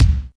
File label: thumpy kickdrum c sharp key, Loudest frequency: 358Hz